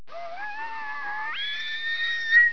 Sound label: alert, houndeye